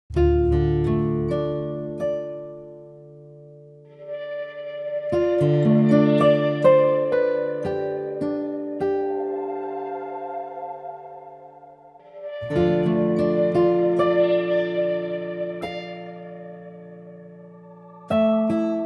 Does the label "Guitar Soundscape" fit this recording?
Yes